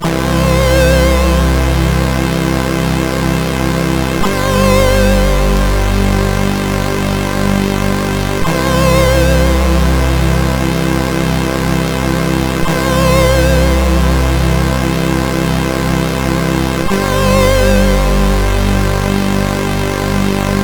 E-Load loader, CSG8580R5; hvsc

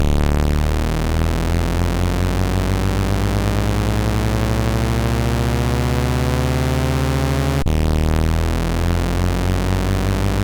Race, sid, MOS6581R3

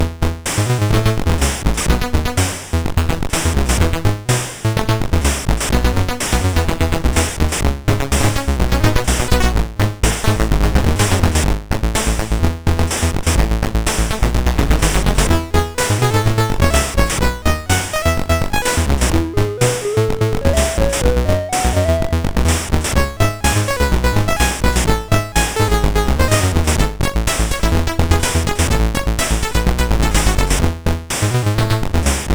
Pick n Pile, CSG8580R5

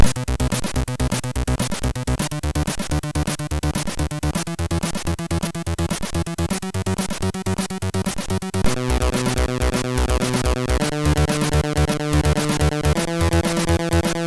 MOS6581; Bring Gift

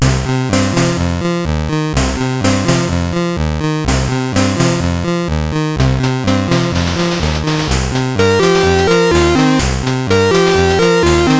CSG8580R5, GAMES; Street Beat

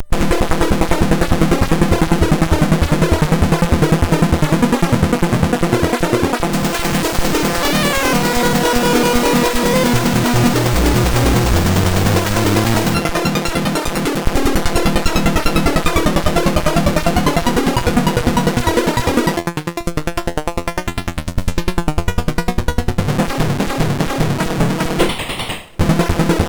MOS6581R2, 8BitChiptune; Some Weird Tune

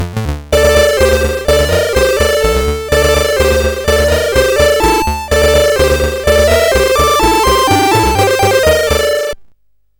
sid CSG8580R5, GAMES; Continental Circus

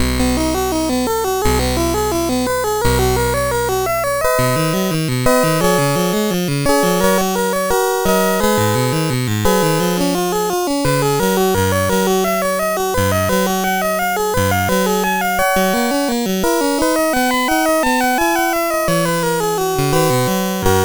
Preludium, sid CSG8580R5; Music Shop

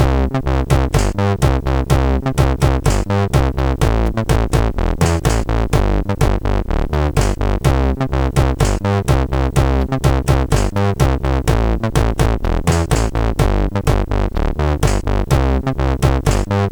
Melo, sid MOS6581R4

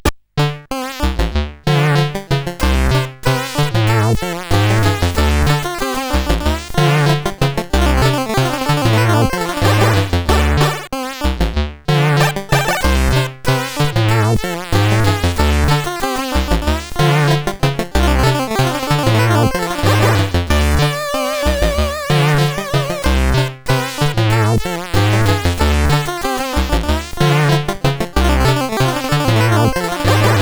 CSG 8580R5

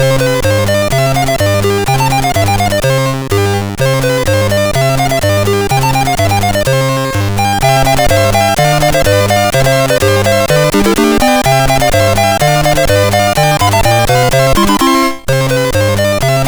C64 version, MOS6581R2

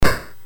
BASIC sid MOS6581R4, GAMES; Sword of Fargoal

